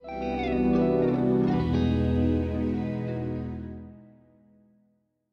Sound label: Completed; Rocksmith